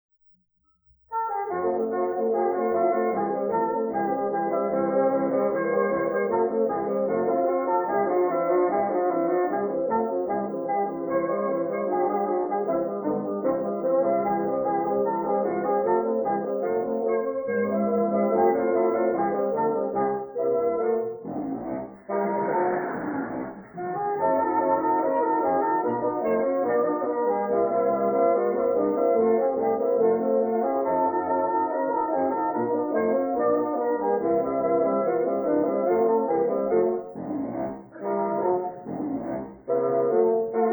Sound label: Magic Bassoon